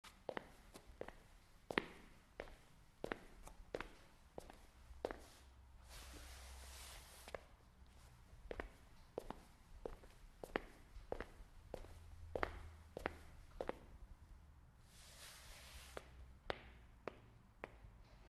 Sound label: Walking steps